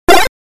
Slime Moving

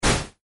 Puff; yet another pixel dungeon android

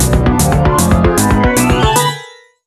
Tonadilla Victory; Devil Kingdom

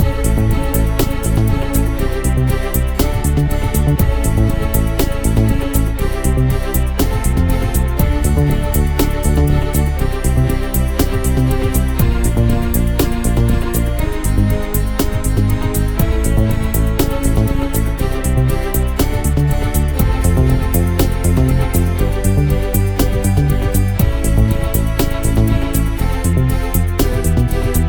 Victory Loop; Monster Madness

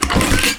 Object Treasure Open; Inotia 3

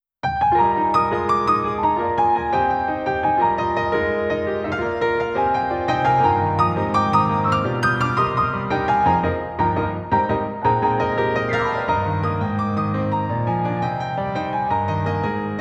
Piano Instrumental

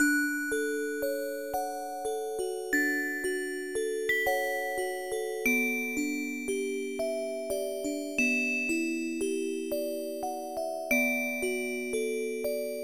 Music Box; My Merry May; dreamcast

